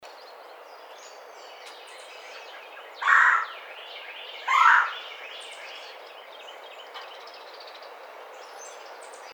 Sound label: Red-bellied Woodpecker